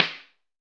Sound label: SN, Snare; AKAI S6000 CD-ROM - Volume 4